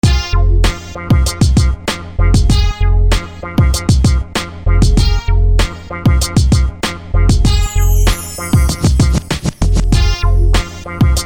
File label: DJ Hip Hop, Akai MPC1000